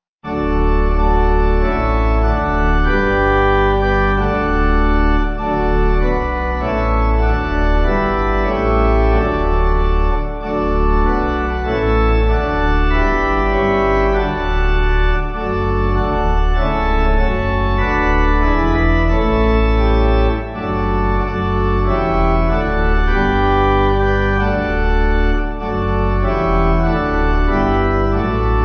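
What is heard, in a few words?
Pipe organ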